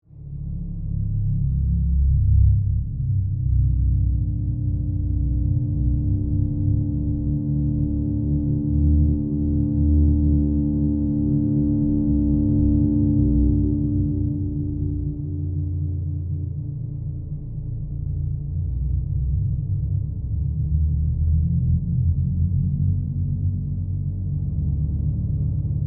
Bed Tonal Pulse, Production, Element, Bed, Tonal, Pulse, Low; Beds; Blast Wave